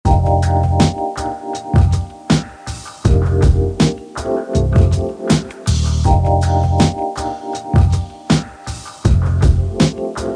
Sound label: Lounge; Hollywood Files Deadly Intrigues; NDS